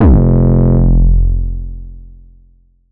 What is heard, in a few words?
Dusty 808 F, hip-hop drum kit